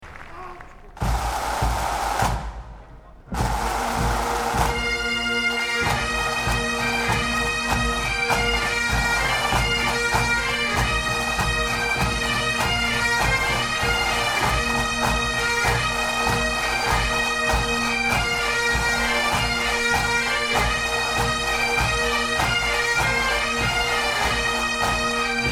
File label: massed pipes and drums; edinburgh; tour2005